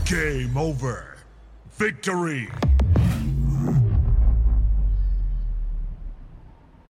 victory; splitgate

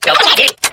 tape reset

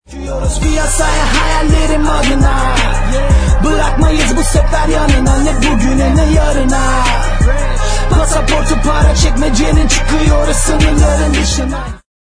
subscriber song

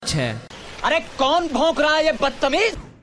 bhoooo

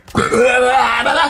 death sound; outlaw; the battle bricks

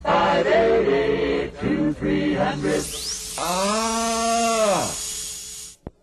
oooooooooooooooow

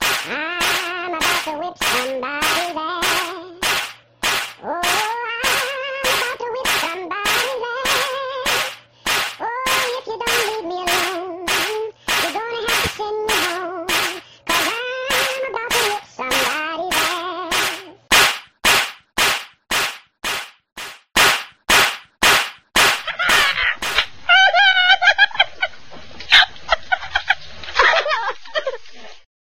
slaps laughs

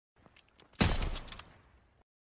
kar98k headshot sound effect; cod ww2

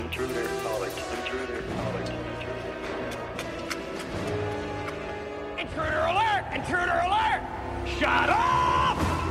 intruder alert

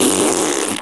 sticking tongue out

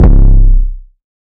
boom

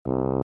fart; bankzitters

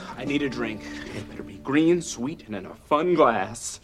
fun glass

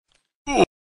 minecraft original damage sound

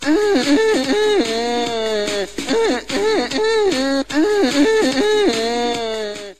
eeuhw eeuhw euuhw

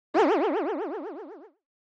poing

